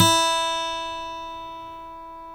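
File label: E1 acoustic guitar, 6-STRING; InVision Interactive; Lightware VOL-1; Instruments & Percussions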